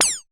zap, Roland MC-909

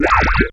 FX, attacks; Best Service Advanced Media Trax Modern Composer

